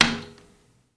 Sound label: Latch Release Hit